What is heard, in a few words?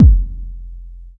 long room reverb steel kick drum sample f key